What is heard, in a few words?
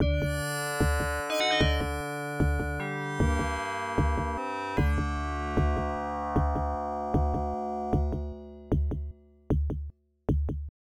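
Heartbeat, game music; prince of persia; ibm pc at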